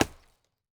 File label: lumber tree